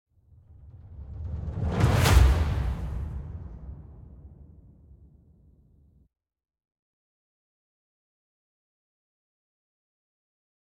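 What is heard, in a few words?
Real Percussion Phrase; Worms Rumble; PS4 PS5 Switch Windows Xbox One Xbox Series XS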